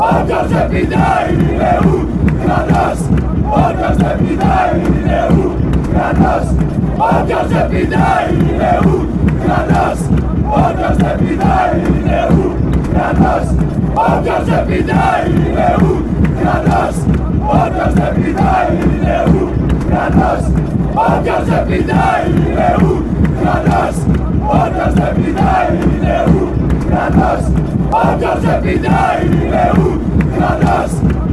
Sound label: chant